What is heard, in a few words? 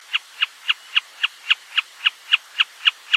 wilsons snipe